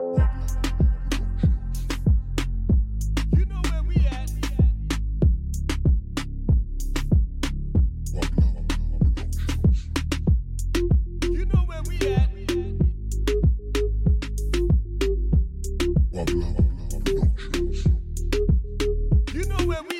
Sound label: Latin Reggaeton